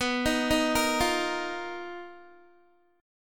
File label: B Major 7th Flat 5th B down Guitar; arpeggiated; 4F,4A,5C,5G,5C,6E; JGuitar